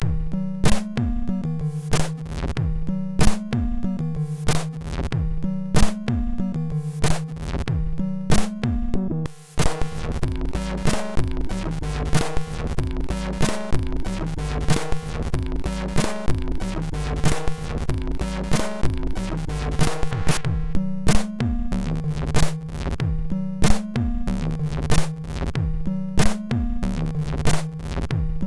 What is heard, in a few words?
sid, MOS6581; hvsc